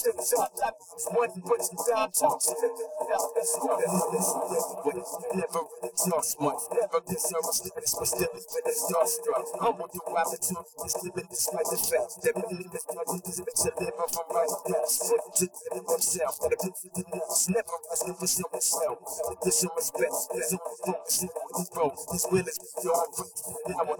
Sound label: vocals; SiSEC11